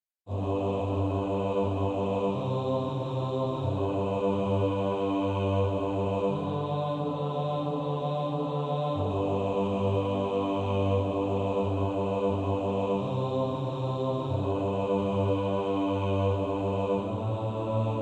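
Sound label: bass, practice music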